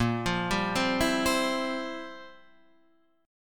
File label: F Major 7th Suspended 2nd Suspended 4th, Bb, guitar